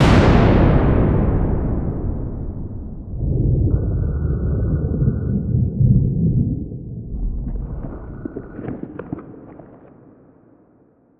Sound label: sfx amb vfx thunderclap; ambient; System VFX